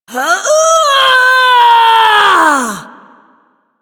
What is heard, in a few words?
Ability Lift Laugh Lich